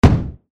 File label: shield; 武器効果音; モノラル